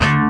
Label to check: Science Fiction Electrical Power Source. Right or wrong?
Wrong